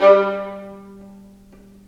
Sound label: STR Vlns Marc; L-CD702; Vlns Marc1-3